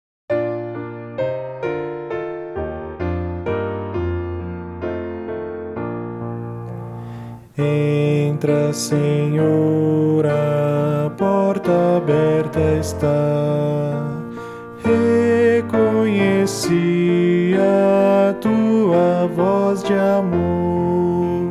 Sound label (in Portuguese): voz piano